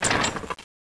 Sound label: shed padlock break